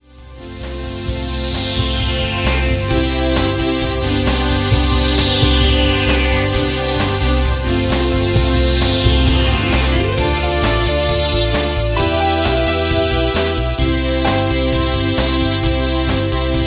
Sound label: Instrumental